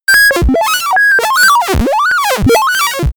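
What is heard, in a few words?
arcade enemy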